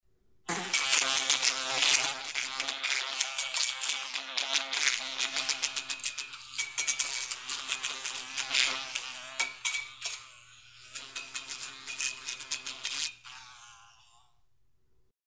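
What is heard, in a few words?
fly beating against a lamp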